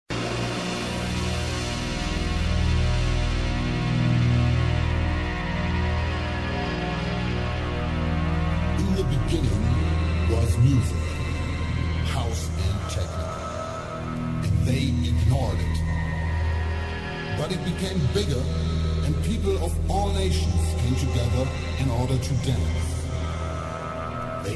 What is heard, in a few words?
Megarave; Maasvlakte Rotterdam 1993